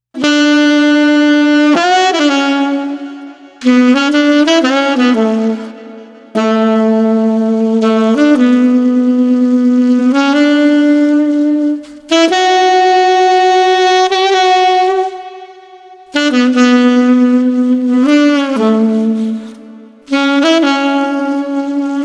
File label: Street Sax Gentle 120